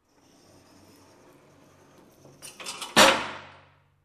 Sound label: Tools dropped on a steel platform